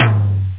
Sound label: tom drum; Deutsche Edition 1; bavarian